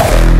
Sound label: hkick E4